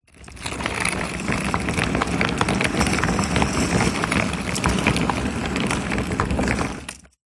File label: household vacuum cleaner roll on tiled floor while off